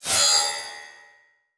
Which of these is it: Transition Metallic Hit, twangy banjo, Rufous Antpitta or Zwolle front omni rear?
Transition Metallic Hit